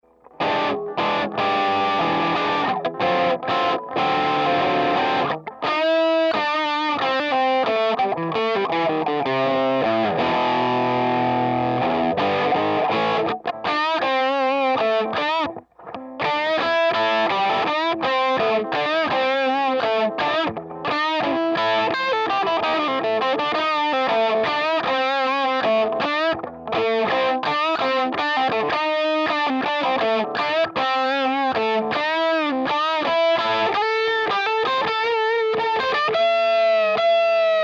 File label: plexi lead